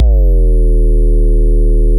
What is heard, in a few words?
Sub Drop D1